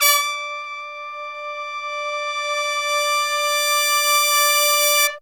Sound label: BRASS SFZ; Zero-G; Phantom Horns